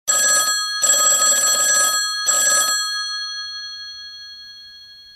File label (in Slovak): zvonenie